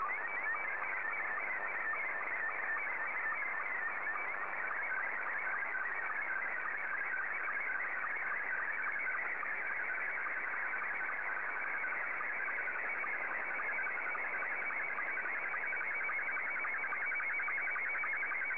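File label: SSTV, Scottie 2; JA3QJQ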